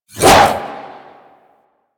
Arch Wing Sword Short Whoosh; Warframe; Weapons

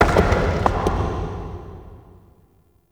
Distant Stone Texture, Ambience; Champion Crypt

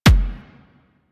Weapon Punch Rifle

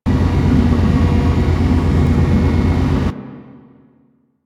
Engine Sphere Loop; Ambience; Warframe; Grineer Galleon

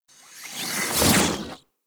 Shrimp Lantern Weapon Projectile By; Creatures; Baliq

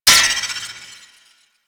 parry bullet; Warframe

